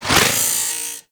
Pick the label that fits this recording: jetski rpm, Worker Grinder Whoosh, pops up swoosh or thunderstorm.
Worker Grinder Whoosh